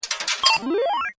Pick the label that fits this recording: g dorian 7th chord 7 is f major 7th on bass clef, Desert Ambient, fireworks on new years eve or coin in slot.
coin in slot